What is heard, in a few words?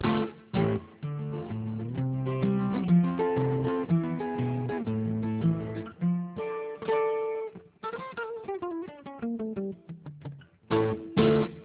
Music G729